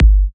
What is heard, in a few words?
basic e kick; Kicks; single hits